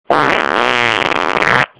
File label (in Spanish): Rajado, pedo